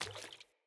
Sandals Walk Water; Player; Footsteps

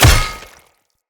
Great Sword Impact Flesh; Weapon Impacts; Player; Swords; Combat; Founders Great Sword

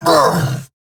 Pain, Battle Barks; Warframe; Eidolon Grineer

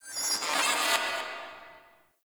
Dojo Tech Beep; Ambience; Warframe; Mod Quest; Teshin's Hideout

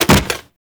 Land Soft Default; Warframe; Teshin; Footsteps

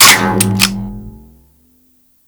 UMP Reload, Weapons

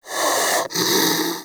Armadillo Vocal Breathe; Warframe; Characters; Hunting Critters

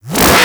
Charge Gun Shot Retract; Warframe; Corpus

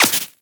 Laser Impact Flesh; Warframe

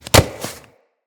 Mantle Foley; Characters

